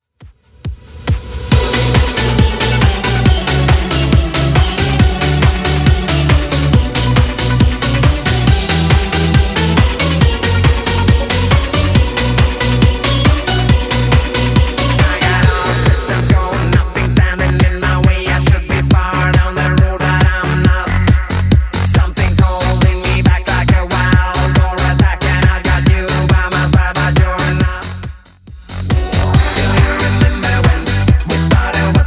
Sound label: 90s Redrum 138bpm